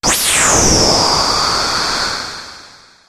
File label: warp